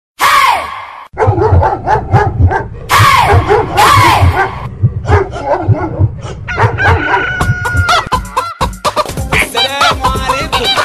alerta sons de animais

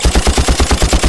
disparos de arma metralhadora

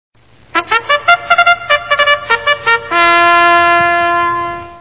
Som de Corneta; toques para celular

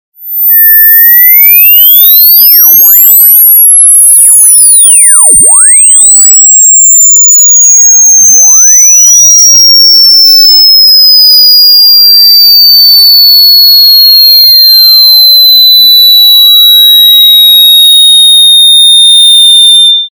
som alto e irritante

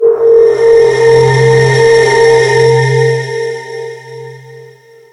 misterio

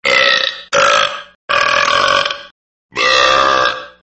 Arroto

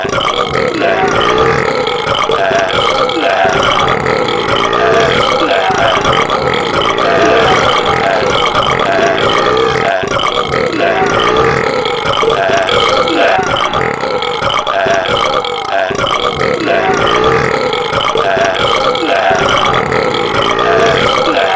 arroto dueto

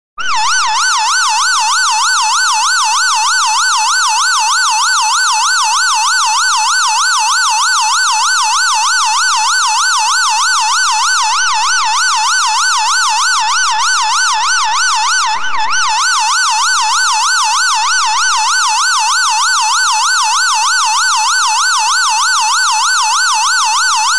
sirene aguda efeito sonoro

